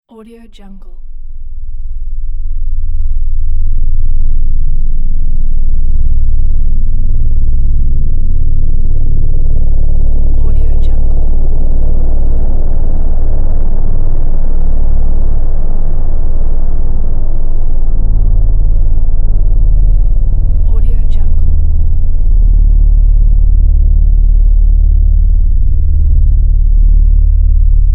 ambience drone

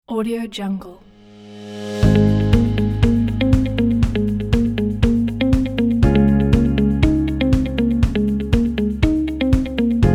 calm corporate motivational

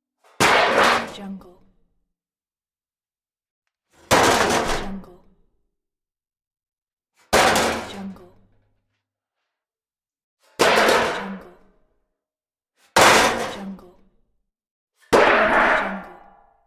falling sheet metal; studiozonet

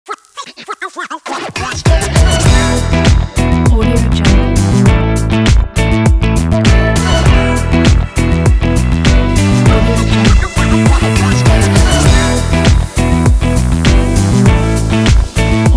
disco; ltsounds

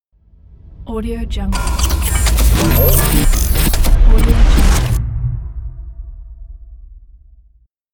glitch logo

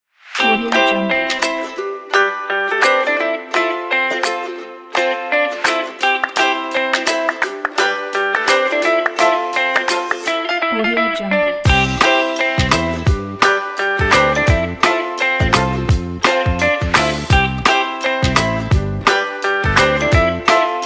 happy ukulele upbeat background

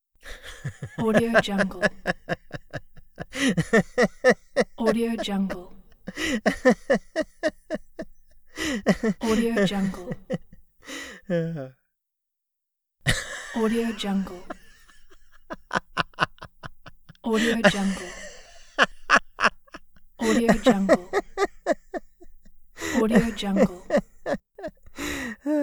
male laughter

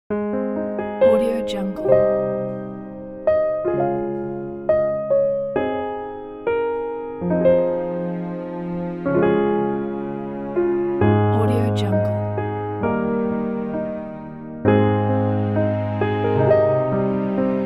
love background

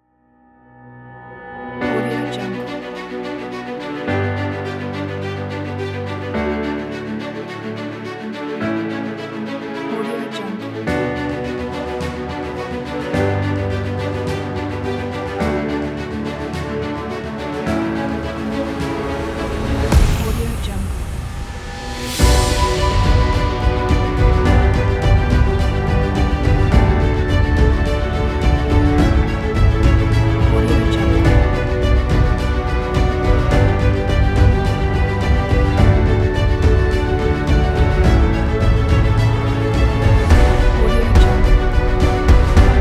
epic motivational orchestral corporate

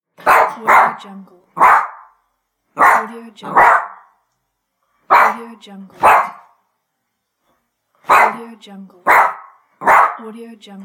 small dog barking

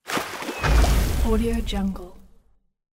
cartoon magic firework; tibasfx

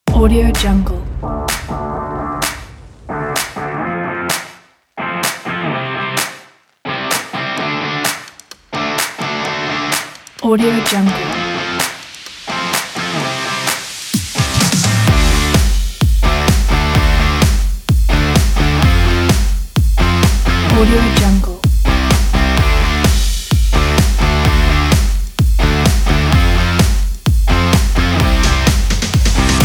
vlog travel uplifting pop rock

